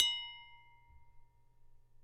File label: Glass A mf